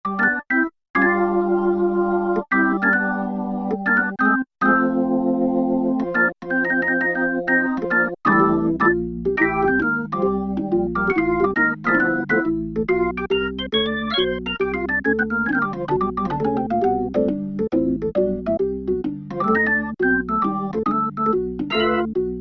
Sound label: B-3 Jazz Perc; Keyboard Instruments; Roland L-CDX-02 Keyboard Instruments